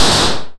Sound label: Hats Ticky Open, Modular